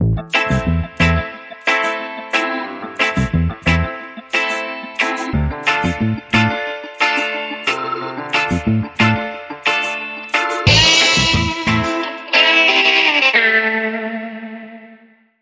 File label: Sting